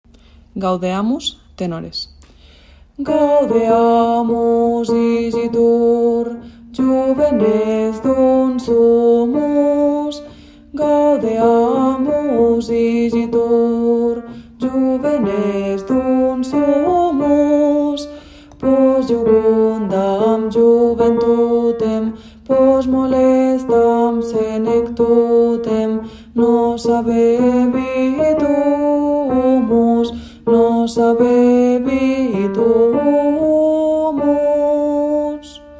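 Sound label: tenor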